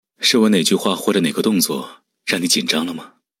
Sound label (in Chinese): 紧张